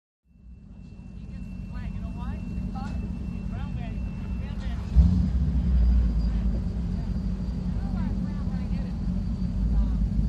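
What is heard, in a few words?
Power Boat Interior, off shore power boat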